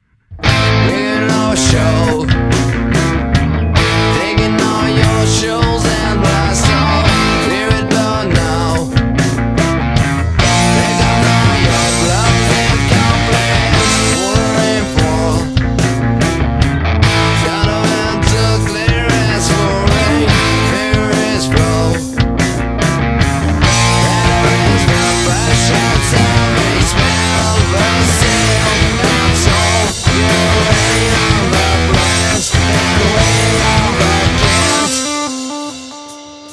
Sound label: old horse